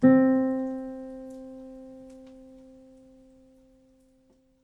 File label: piano sample